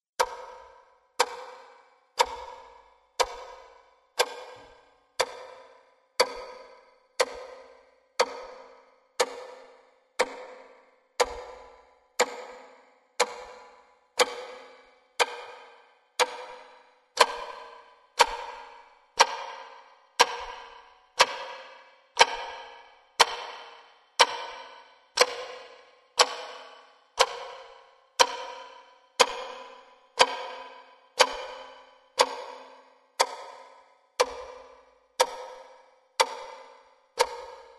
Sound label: clock; easyrpg; Ib